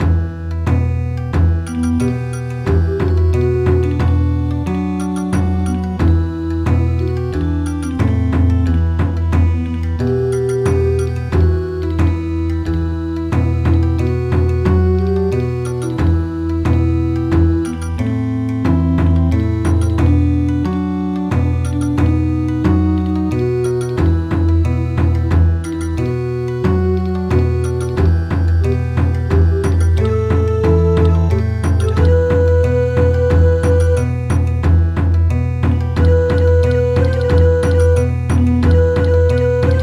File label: Bon Odori, midi